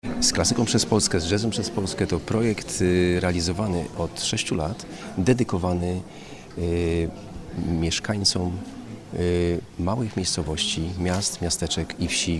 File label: jazz; z klasyka i jazzem przez polske